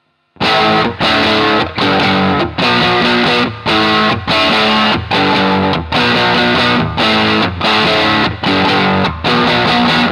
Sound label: bassman avec classic fuzz; ik multimedia amplitube hendrix